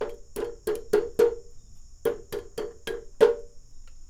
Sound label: perc